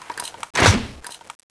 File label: shoot; weapons